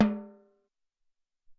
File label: Tm Bal